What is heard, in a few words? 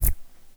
hand